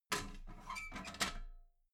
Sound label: metal ladder small handle fold soft